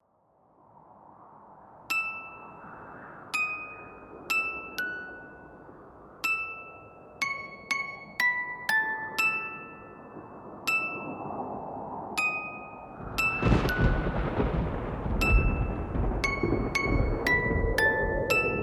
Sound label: Music Box storm